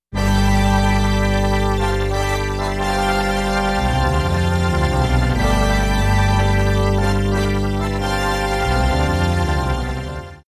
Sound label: Nord ARP Omni; Nord Modular; arp strings